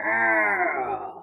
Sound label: grunt